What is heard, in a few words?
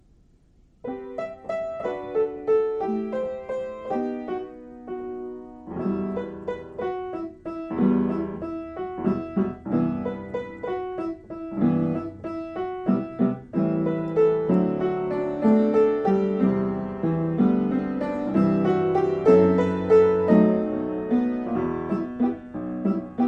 piano only